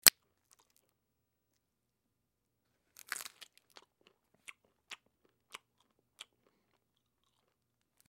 Break Shell Chew Lips; chewing

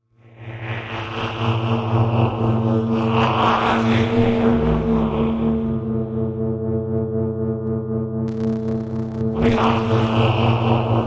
Mystic Voices Ancient Ritual Low Drone; Ancient Intercept

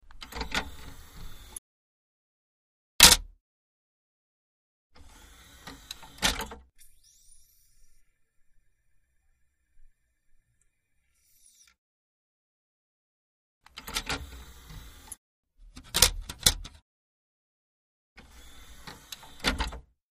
Compact Disk Player

